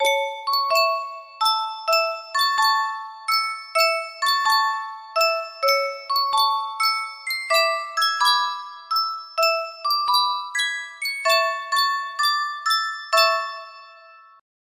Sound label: sankyo music box